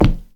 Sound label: Wood Step